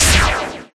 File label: Attack; Dungeon SS2022